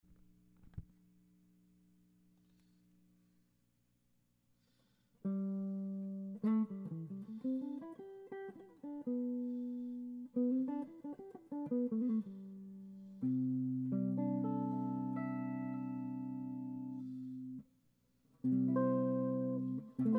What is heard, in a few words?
Kentucky KM300E